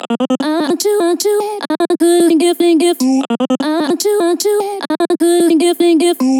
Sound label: Vocal Chop Loop 150 F; Vocal Loops; Ghosthack Advent Calendar 2018 Day 9